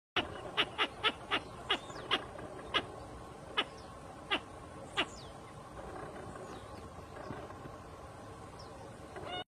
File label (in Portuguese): Canto do Mergulhao cacador